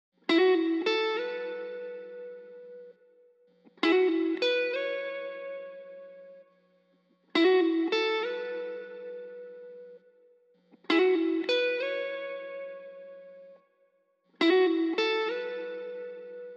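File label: 68 BPM A Major Lead, Guitar; Melodies; Loop Stems; Avenue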